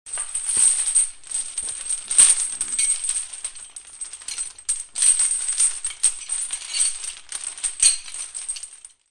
wheel chain

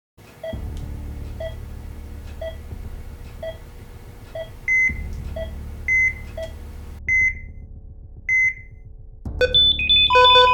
operating room

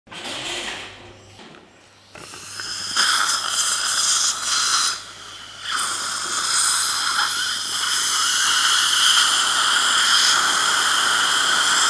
Dentist suction

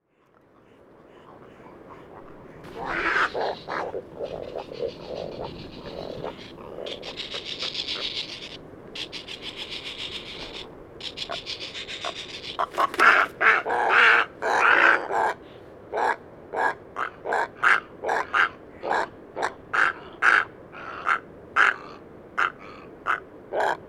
Dimorphic egret

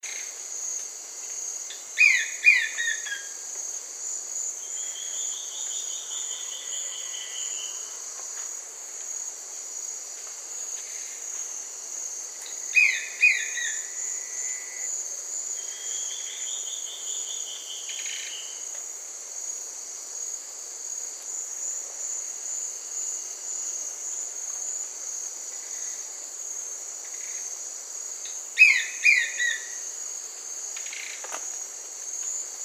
Brownish twistwing; پرنده تابیده بال قهوها

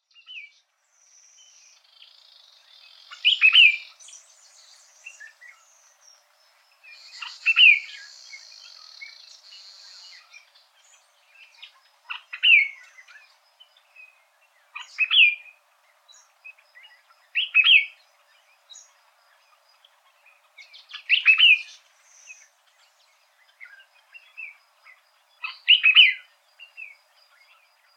African red eyed bulbul; پرنده بلبل چشم سرخ

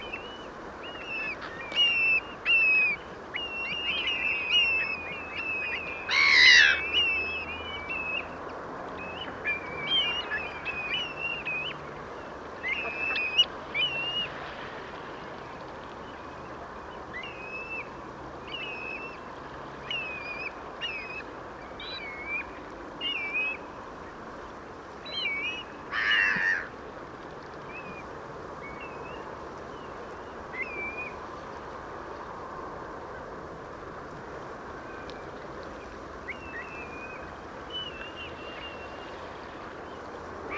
Grey plover

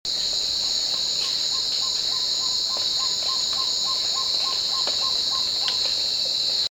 Black tailed trogon

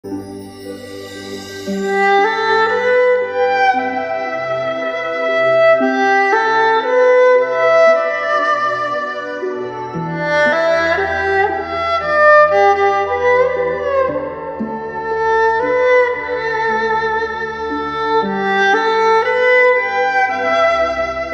Erhu

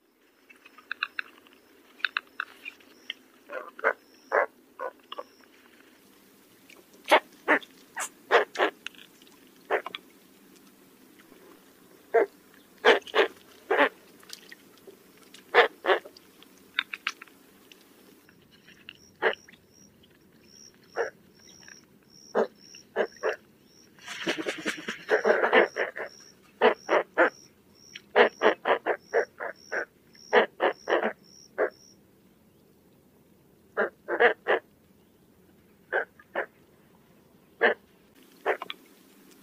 Spoonbill, كفچه نوک

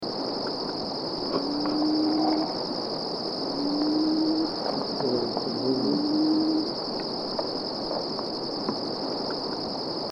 Plains wanderer

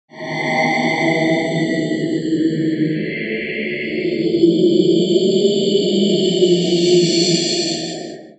Foggy environment

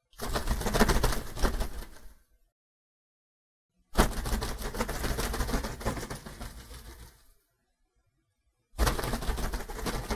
bird wings sound

